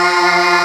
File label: MELLO CHOIR; WORS STATION; DANCE #3